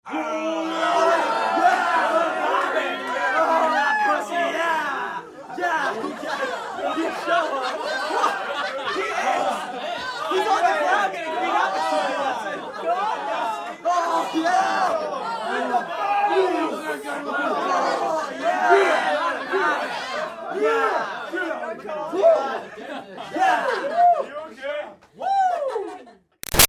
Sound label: Crowd Teens Reactions To Fight Laughs Yelling; Backgrounds; Crowds; Big Room Sound